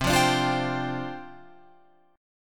C Suspended 2nd Suspended 4th, down, Guitar, Open F; Csus2sus4 strummed